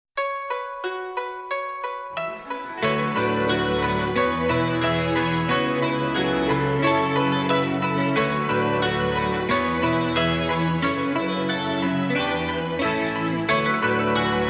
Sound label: Instrumental; Yorinuki Gintama-san